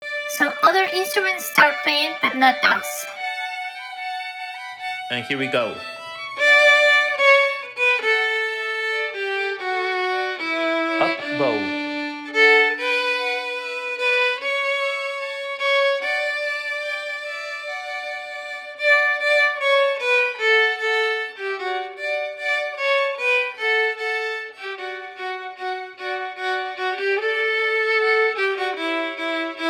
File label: Joy to the world melody no slurs, violin